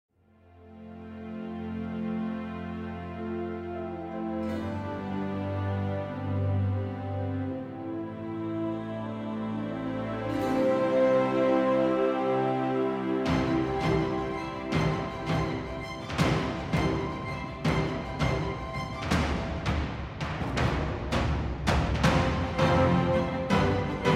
Instrumental Version, Epic Orchestral Cover

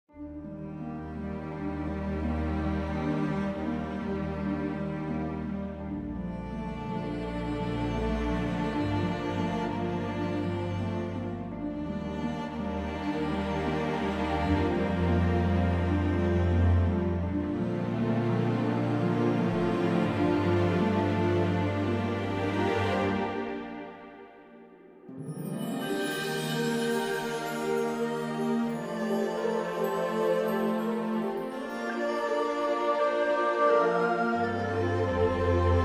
Cinematic Orchestral Cover

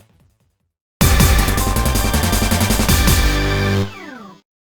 Summon Music; YuGiOh Over the Nexus

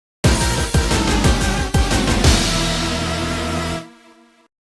Puzzle Failed; YuGiOh Over the Nexus

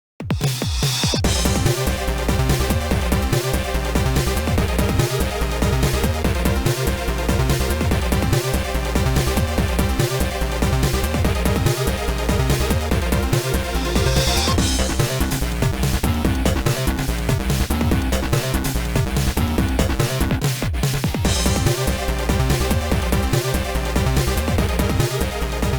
Turbo Duel Music; YuGiOh Over the Nexus

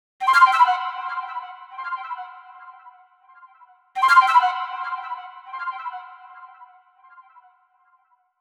The Celestica Flute; Pokémon Legends Arceus

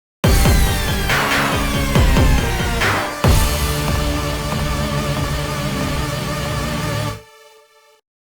Game Over Theme; YuGiOh Over the Nexus

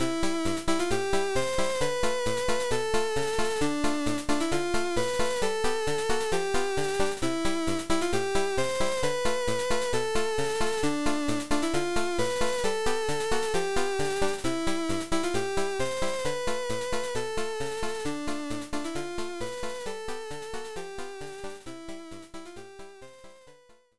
GB Sounds; Pokémon HeartGold & SoulSilver

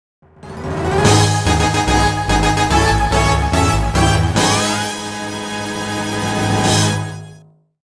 Summon Music; Earthbound Immortals; YuGiOh Over the Nexus

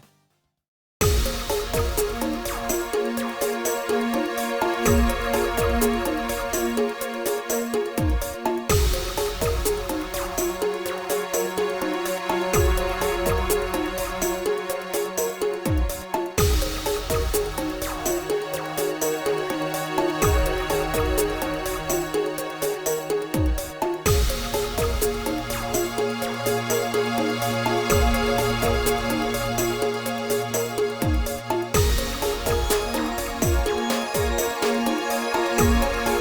BGM Music; YuGiOh Over the Nexus

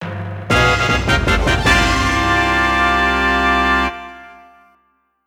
Fanfare

